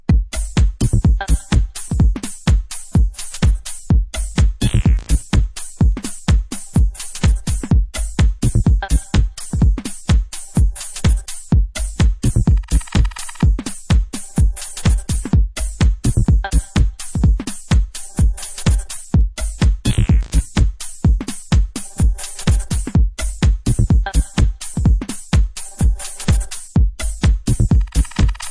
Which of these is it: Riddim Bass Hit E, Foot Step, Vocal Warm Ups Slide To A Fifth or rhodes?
rhodes